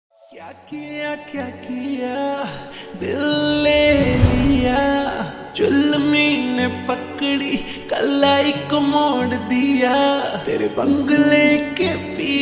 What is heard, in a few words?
Slowed Reverb Ringtone